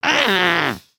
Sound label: Angry; emotes